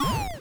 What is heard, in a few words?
MOVE; C64 Dreams; Multi-Sound Default